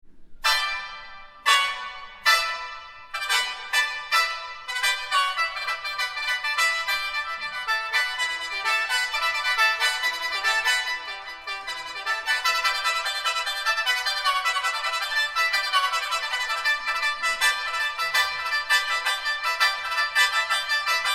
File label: sheng